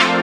SYN DANCE; Sound & Vision - Gigapack II

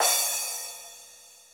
cymbal, Roland MC-909

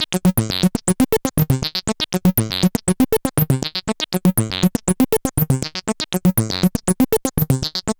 Synth Sequence; Sonic Foundry (Sony Creative Software); Prototechno

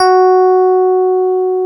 KEY EP F#3, Digital EP's

